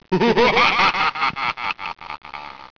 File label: laugh; Lachen